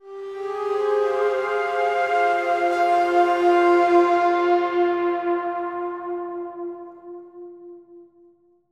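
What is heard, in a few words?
Synth F minor; torchlight iii; ps4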